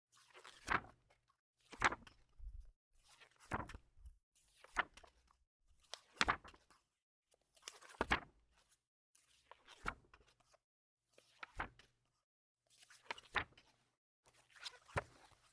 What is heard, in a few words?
turn paper journal fast